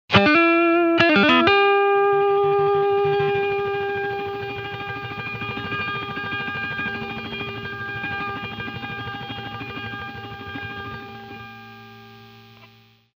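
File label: electric guitar compressor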